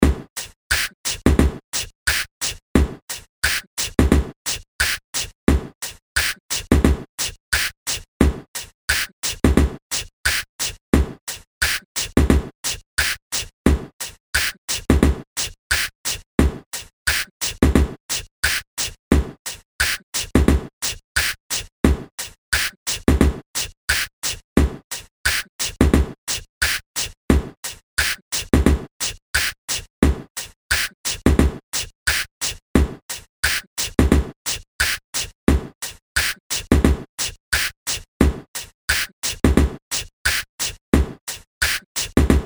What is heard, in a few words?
Hip Hop Karaoke